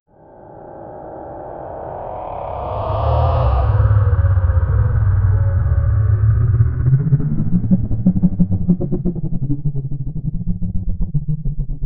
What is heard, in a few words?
futuristic sound of flying car